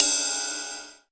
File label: ride exciter